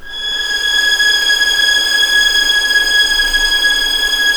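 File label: G#6 legato mf violins, right; East West; The Ultimate String Collection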